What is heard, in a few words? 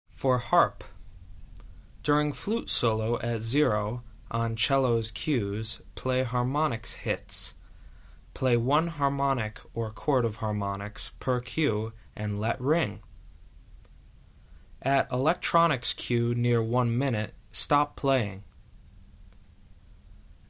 Score Harp; EricRoth